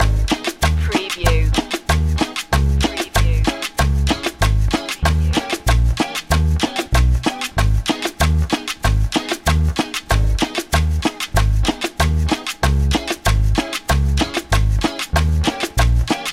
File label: colombian party loop